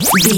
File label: retro shield on deflect